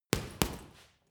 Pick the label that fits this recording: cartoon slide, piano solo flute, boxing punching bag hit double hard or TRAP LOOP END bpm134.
boxing punching bag hit double hard